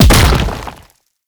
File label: Hand Bomber Proj By Explosion; Warframe; ManInTheWall